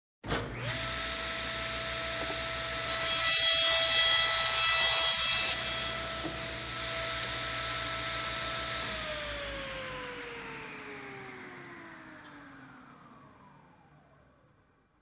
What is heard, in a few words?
Electric Cutting Machine